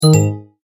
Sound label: multimedia tone error; Tones; Sound Design